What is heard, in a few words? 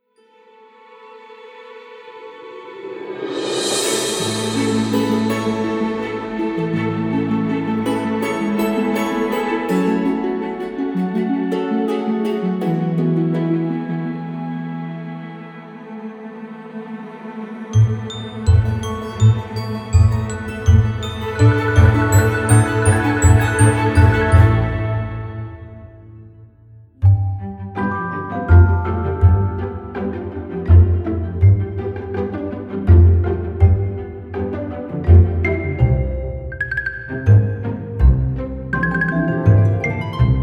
Fantasy uplifting playful